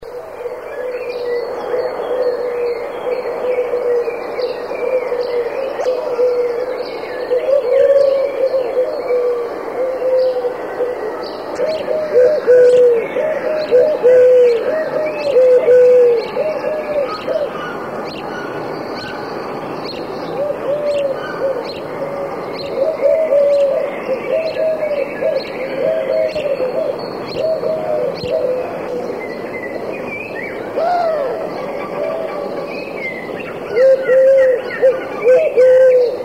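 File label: turtle dove; hrdlicka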